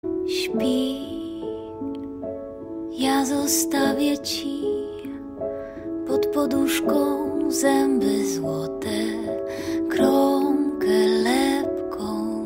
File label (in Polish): Kolyska